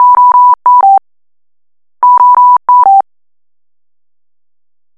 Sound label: Drger Oxylog Sound